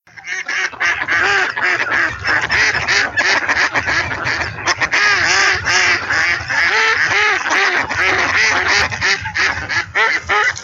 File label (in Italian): GERMANO